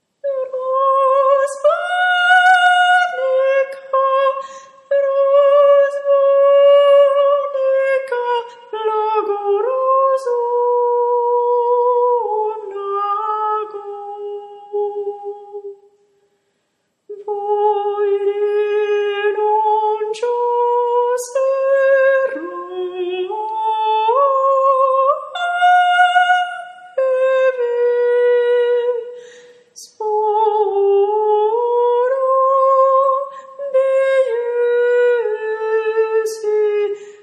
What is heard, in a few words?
razboinica tenor